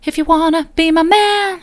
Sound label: soft, vocal